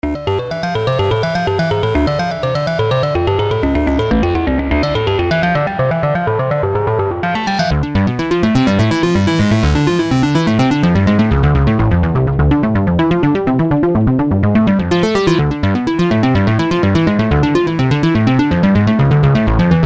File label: Analog FM